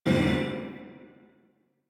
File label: Scrap Container Dome Out; Warframe; Missions; Zariman; Zariman Assassinate